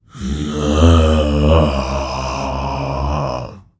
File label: Dino Groan